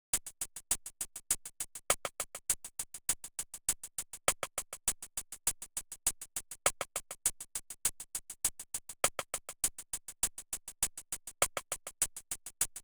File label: Gloomy without Rhythm